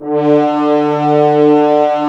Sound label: Brass F.Hrns D; Miroslav Vitous - Woodwind Brass Ensemble